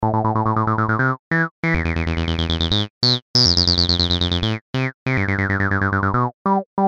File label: acidline